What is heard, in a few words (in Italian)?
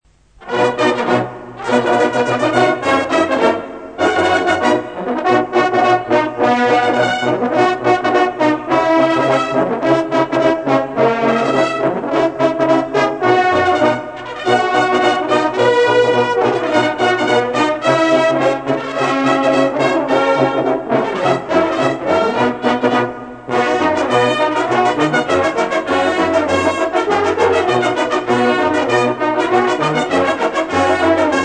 Fanfara